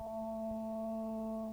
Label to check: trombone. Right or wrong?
Right